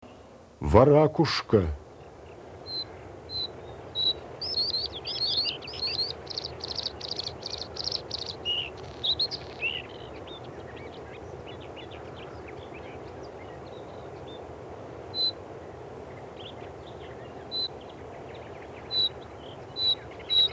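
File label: bluethroat voice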